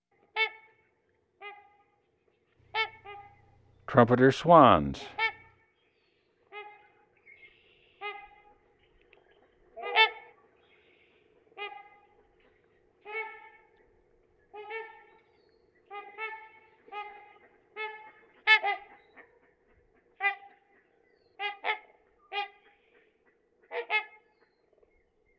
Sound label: Trumpeter Swan; Western North America; Dan Gibson; Wildlife Identification By Sound